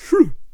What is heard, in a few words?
Bard Attack Vocals